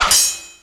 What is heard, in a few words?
Swords Clash